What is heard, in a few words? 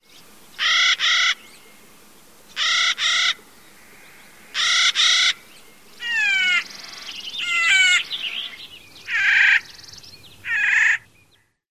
calls